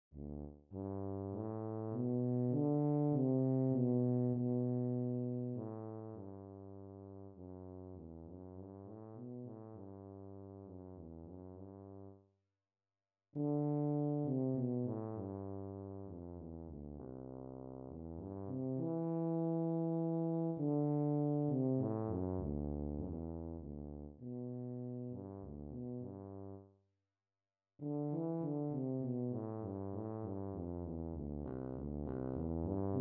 Tuba

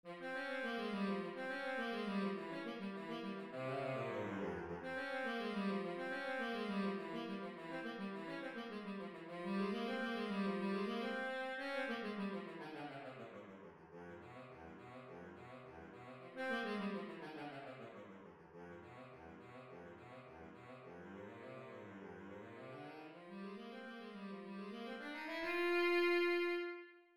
Baritone Saxophone

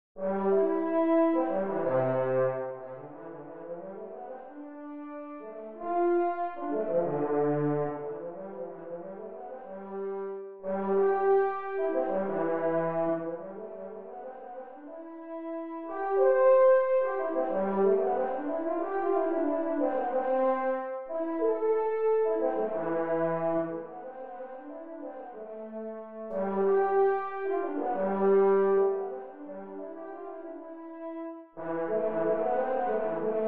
French Horn

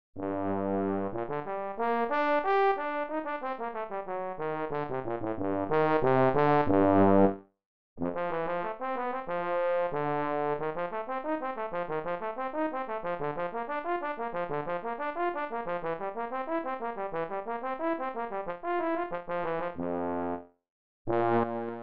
Baritone B.C.

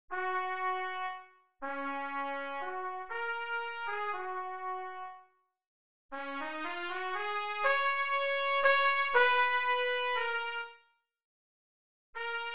Trumpet

